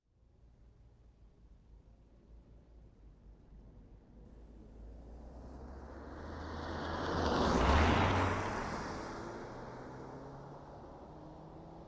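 chevrolet silverado tow truck exterior fast by left turn; point m10; sonniss gdc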